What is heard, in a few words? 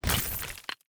gold block destruction stone